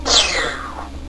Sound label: lasers; QUAKE